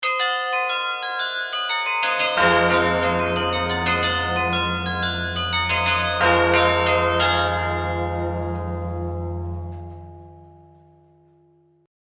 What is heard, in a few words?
Baroque